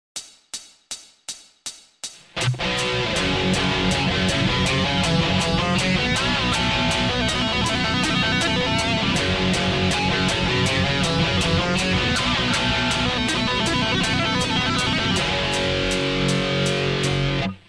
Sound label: tapping